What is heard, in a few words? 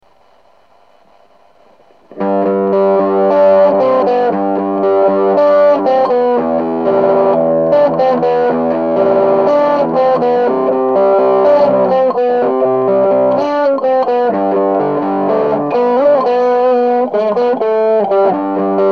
Guitar Riff